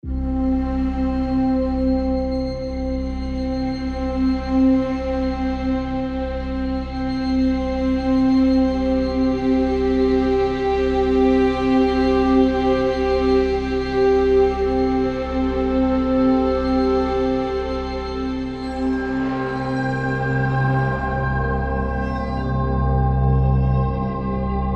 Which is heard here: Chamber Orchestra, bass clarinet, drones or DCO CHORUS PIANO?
drones